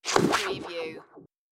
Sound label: Scifi Production Element - Zap Twirl